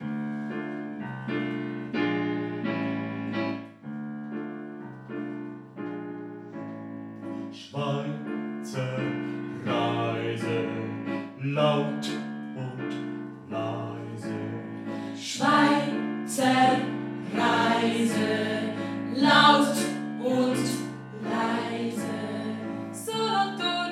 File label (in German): audio einstimmig